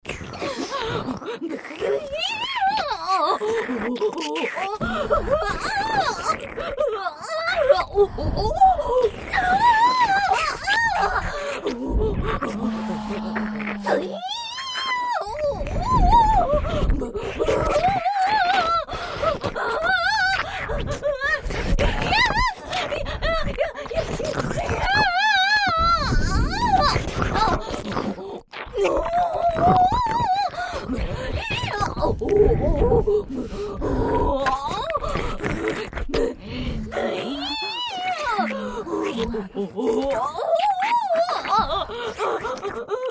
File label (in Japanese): ブタ声イキ狂い＋ねっとり耳舐め